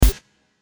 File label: Noisy Kick